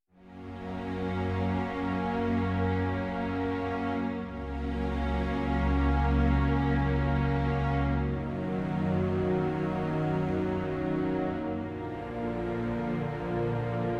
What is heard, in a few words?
strings only